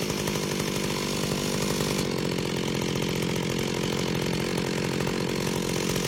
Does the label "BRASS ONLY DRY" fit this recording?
No